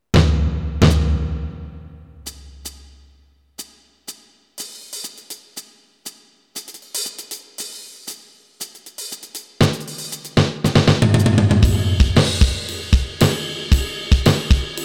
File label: ALESIS Debut Kit